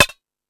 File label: Hip House, drum machine